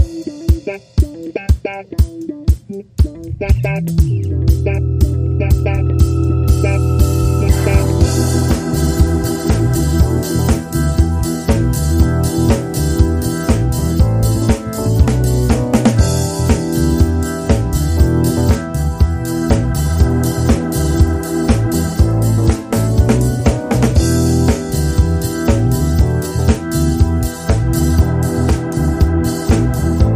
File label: Disco Funk Guitar Lite